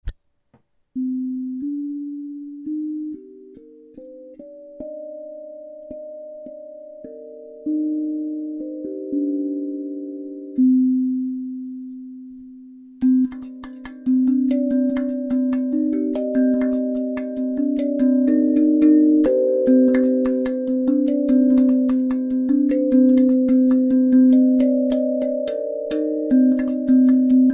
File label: pygmy, drum